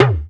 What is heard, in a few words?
Talk Drums